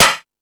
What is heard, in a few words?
House Machine, Drum Machines